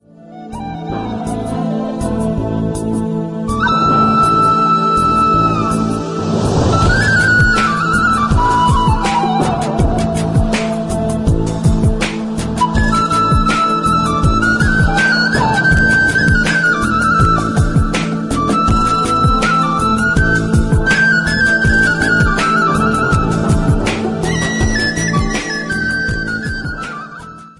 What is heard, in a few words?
dub flute